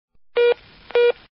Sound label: BUSY